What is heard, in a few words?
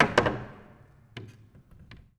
Music Stand, Piano; Piano Miscellaneous